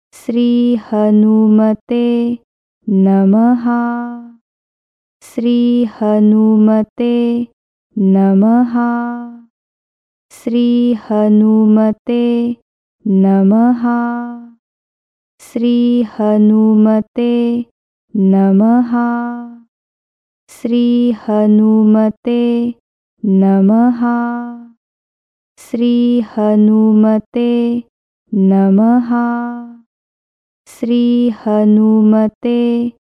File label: deity hanuman chant